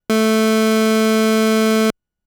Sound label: Pulse A3; fleet keys Samples